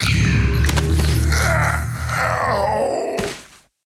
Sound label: Zulac Death; Puzzle Chronicles; PS3; PSP; X360